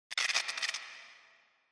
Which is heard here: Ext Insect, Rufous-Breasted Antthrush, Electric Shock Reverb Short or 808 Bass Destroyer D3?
Electric Shock Reverb Short